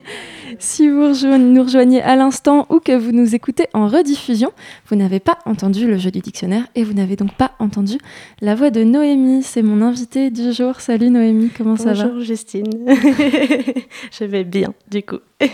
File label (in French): voix d'ukraine